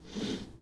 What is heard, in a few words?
Foosball Forward Slide